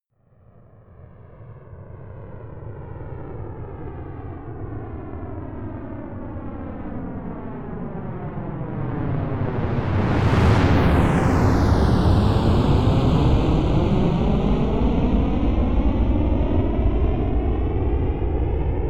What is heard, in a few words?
spaceshuttle rocket flyby slow